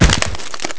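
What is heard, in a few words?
body fall; The Elder Scrolls III Morrowind GOTY; XBOX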